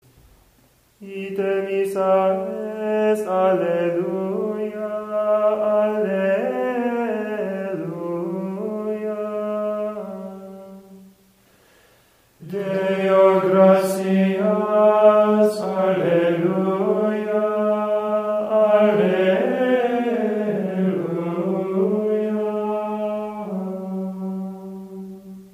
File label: Ite Missa Est Alleluia; Easter Vigil